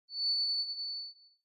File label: Microphone Feedback